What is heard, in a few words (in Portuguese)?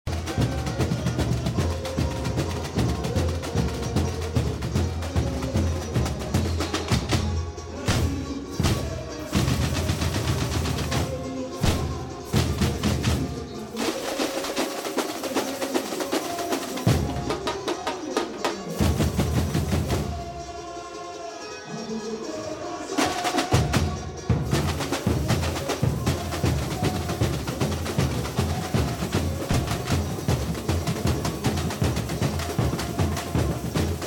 Break Ao Vivo; Double V; Viradouro; Rio